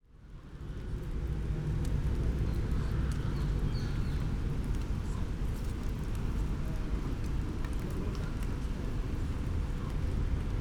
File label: DPA Quiet ambience people passing rain distant birds